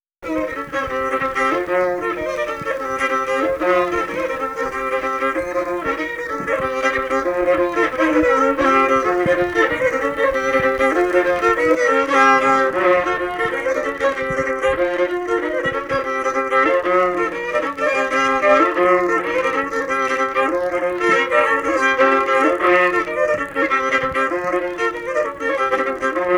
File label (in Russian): Камаринская (на нижних струнах), Кульнево